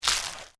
Squish Crunch Bug; Horror; Blood and Guts (Sound Effects:Horror); BlastWave

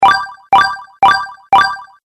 Score Tally Game Loop; Video Game Sound Effects

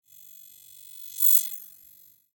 Scanner Beam By, Scifi; Science, Fiction; Tech Sound Effects; SoundEffects-BlastWave

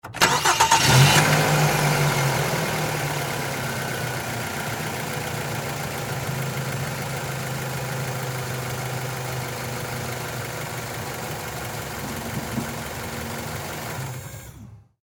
Car Mazda 3 On Idle Off; Car 2009 Mazda 3 On Idle Off; Part of the LYN110 package of Power Car sounds; Car Sound Effects

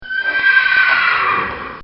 Horse Whinny; Horses; Animals; Bobby Cole

